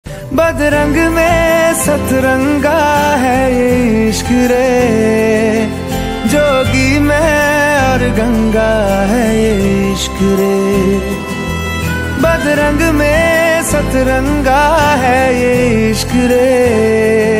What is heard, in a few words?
instrumental ringtone love song ringtone bgm ringtone; animal movei